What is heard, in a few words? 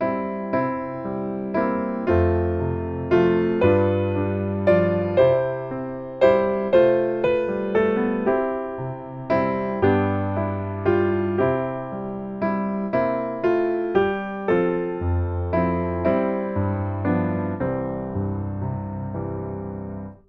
piano